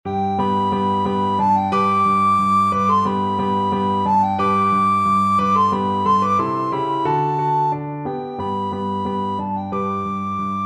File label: recorder